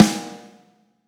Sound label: snare hazy